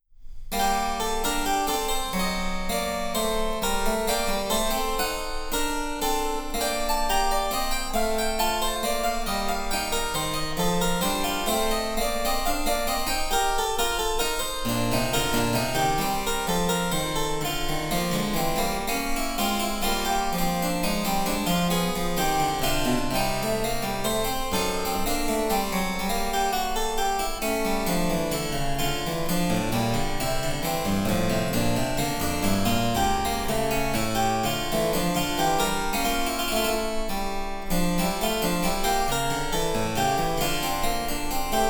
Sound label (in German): Cembalo; Mark Lindley WTC 1